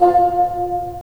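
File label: wind bassoon; LA Composer vol. 3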